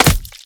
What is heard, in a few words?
Ranged Physical Heavy; Kilta